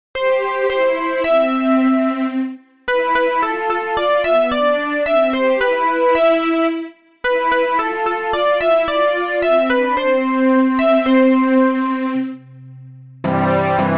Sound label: keyb engl